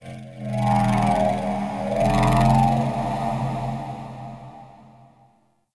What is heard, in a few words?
Root Cave Didge Texture; Ambience; One Offs; Neathuns Root Cave